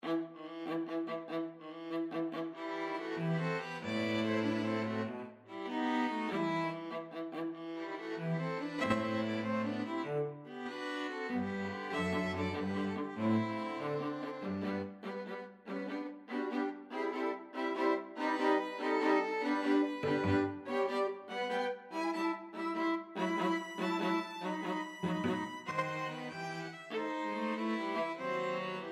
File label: serenade, string quartet; elgar